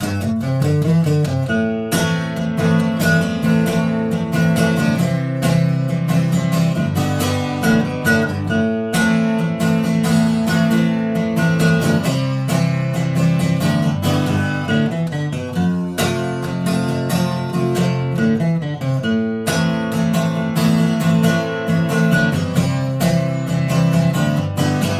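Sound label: subway play for tips playlist guitar; The Sims 3; Windows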